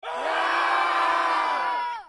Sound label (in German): kampfschrei kindergruppe